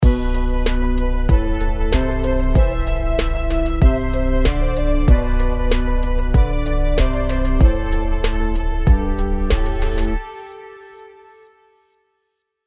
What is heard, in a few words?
plus kit sampler